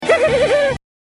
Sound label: ehehehehe